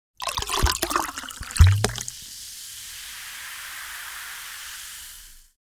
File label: Chugging Soda Pouring Into Glass w Fizz; Household